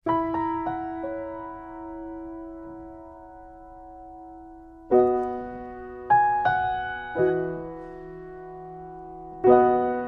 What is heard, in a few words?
Silent Night, without Jingle Bells